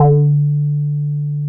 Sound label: D3, Bass; E-MU Producer Series Vol. 6 – Analogia Project (CD 1); Boom da Bass 2